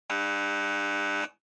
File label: Quiz Buzzer Long